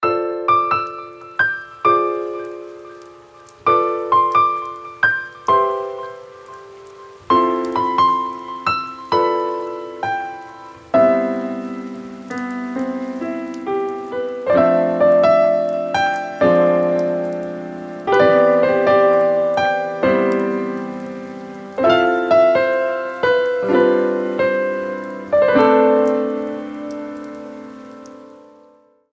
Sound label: In The Rain, ringtones